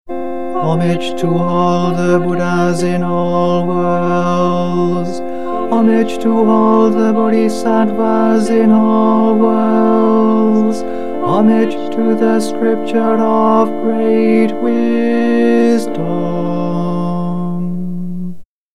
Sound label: tenor, harmony parts morning service; Three Homages